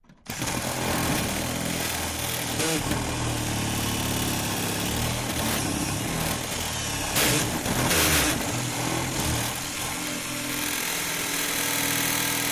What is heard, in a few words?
Machine Drill Hand; drills